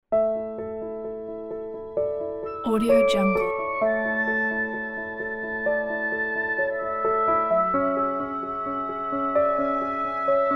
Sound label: romantic piano